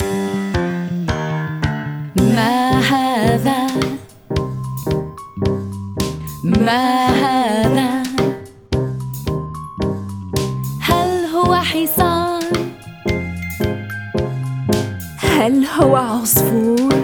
song arabic

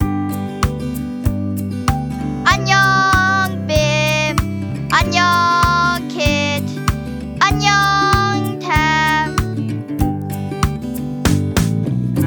song korean

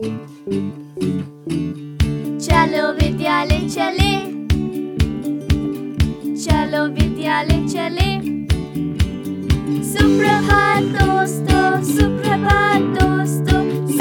song hindi

